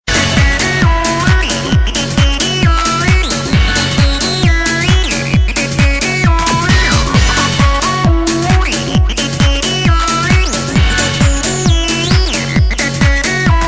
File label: Techno Party